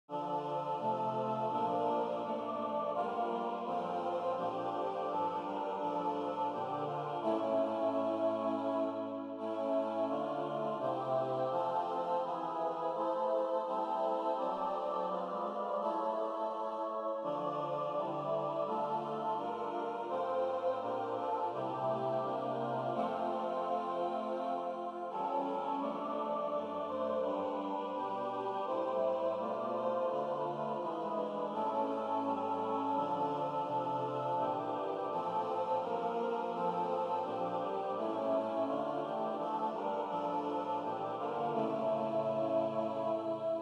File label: Choir